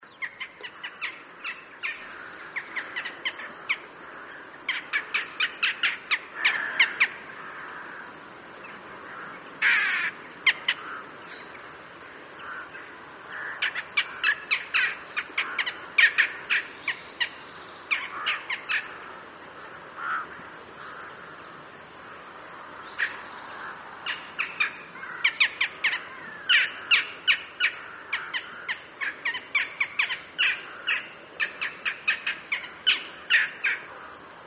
Choucas des tours

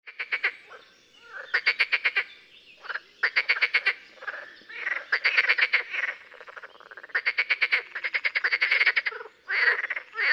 Grenouille rieuse

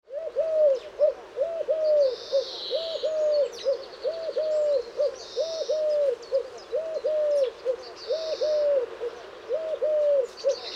Tourterelle turque

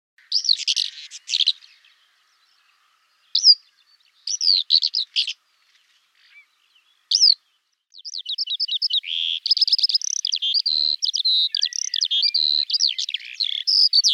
Alouette des champs

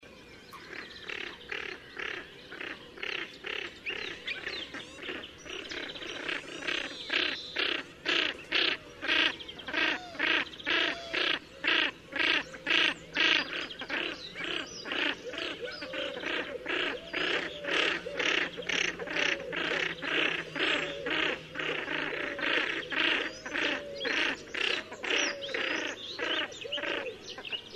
Fuligule milouin

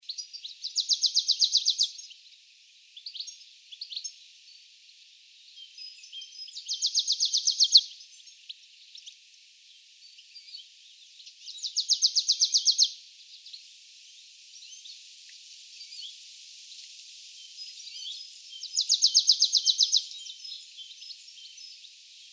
Mesange nonnette, chants oiseaux

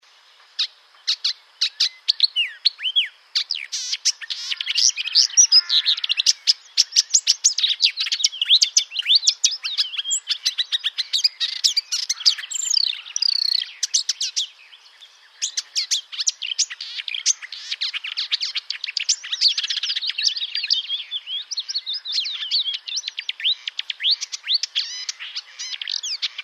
Rousserolle verderolle, chants oiseaux; Bonnevaux

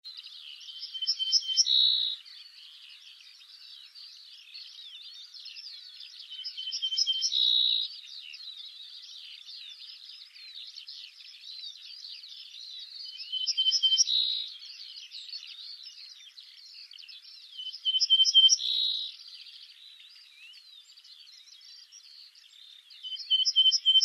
Bruant ortolan

